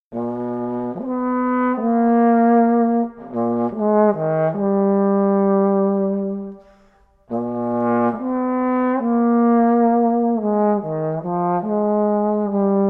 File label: Bass Trombone